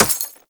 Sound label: Bullet Impact Glass Large; Weapons